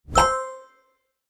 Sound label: positive success